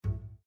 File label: Windows Navigation Start; Windows 7 Sounds; Cityscape